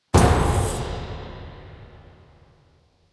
Role Check; LFG; Interface

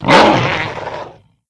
Saber Worg Attack

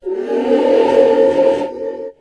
pre aggro; Fel Reaver

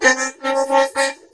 Robot Pet Clickable

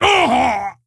Wound Crit; Thorim

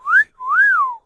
Cat Call Whistle; Emote

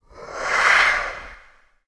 Void Walker Pre Aggro

